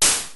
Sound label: DF-500 HiHat, Drumfire